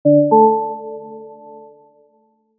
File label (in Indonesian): benar pretest